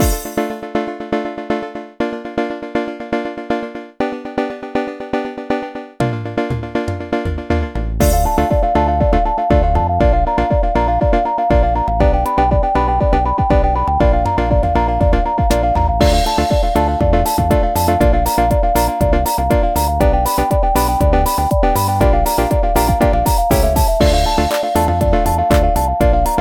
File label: little game loop